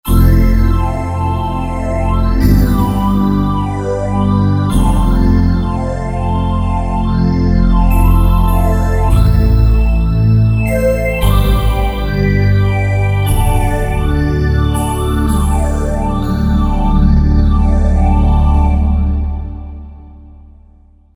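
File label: Heaven Stack, Generalmusic Equinox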